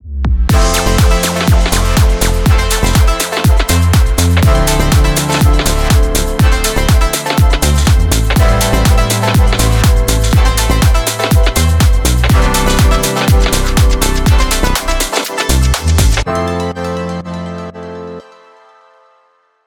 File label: fashion lifestyle house